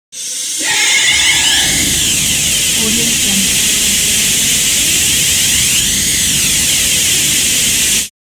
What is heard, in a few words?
fire extinguisher; wistansound